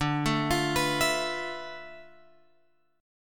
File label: D Minor 6th Add 9th D; Dm6add9 Chord {10 12 X 10 12 12} arpeggiated